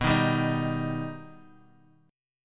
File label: B Augmented B, chord